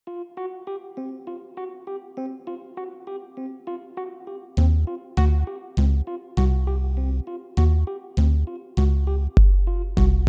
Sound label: Boombap II; MUSIQUE ASSISTEE PAR ORDINATEUR; instrumental